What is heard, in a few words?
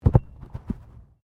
Body movement Tumble Medium; Body movement foley to simulate falls and deaths; Rolling